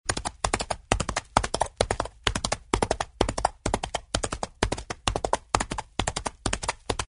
animals horse galloping on dirt

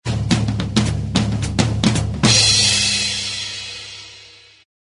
jungle drum accent

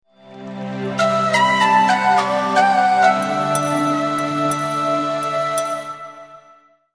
Paradise Zen Alert

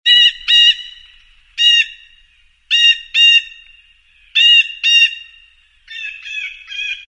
blue jay call

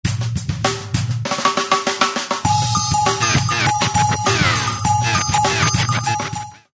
cowbell rhythm scratch

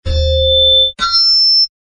microphone feedback with hit

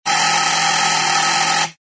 buzzer single door

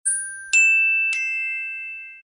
orchestra bells three tone doorbell

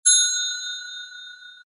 bell desk single ring

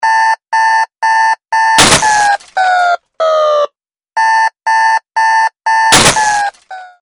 Alarm

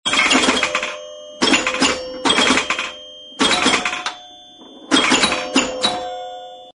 bell pinball modern play

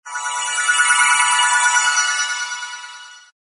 electronic shimmer

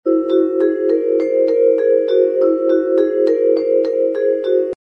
vibes hypnotized; fantasy

